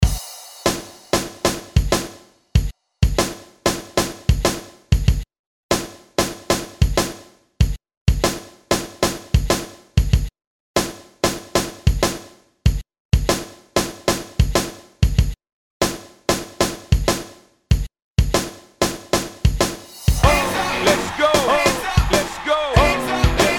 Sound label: Hype ReDrum Rock 95 Bpm